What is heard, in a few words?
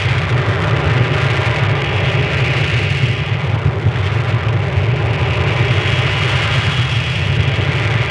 cis-12 dsb